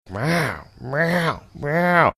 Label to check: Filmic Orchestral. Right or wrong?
Wrong